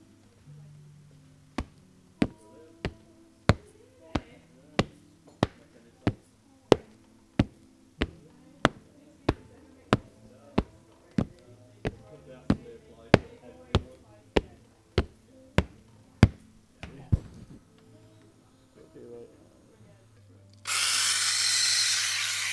Footsteps And Milk Frother Mono

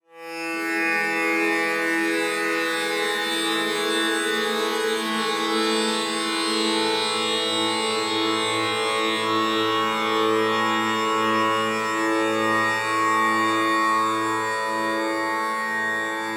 Tanburaa Four strings

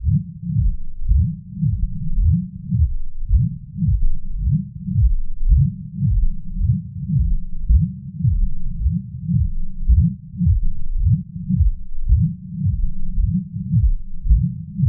Bigfoot Heart

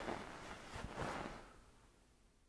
Rustling Bed Sheets

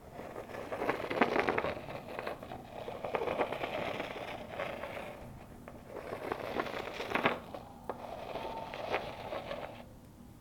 Dragging Stone

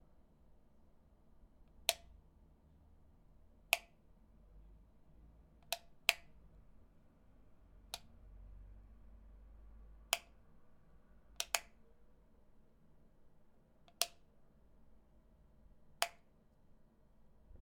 Light Switch

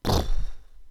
Puff of smoke

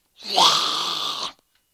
Monster Noise

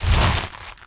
Munch

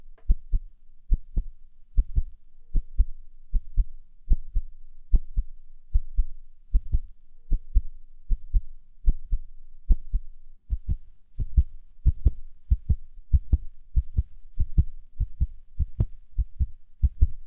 Heartbeat

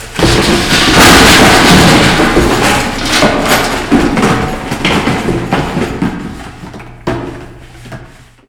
Destruction Misc

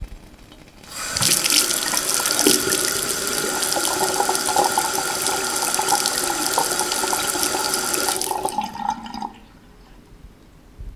Water Bathroom; Zoom

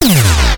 Laser Shot Big

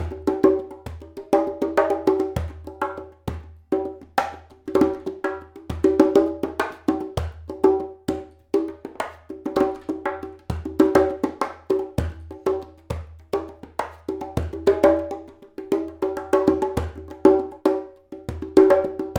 Conga Loop 100bpm

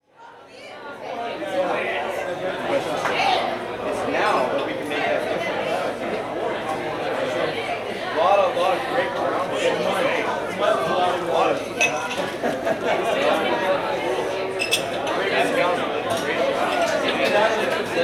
Bar Crowd, Pub; Logans Pub

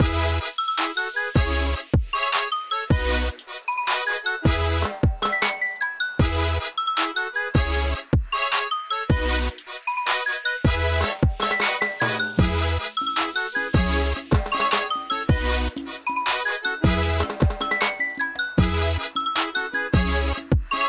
Tropical 77 5 Bpm

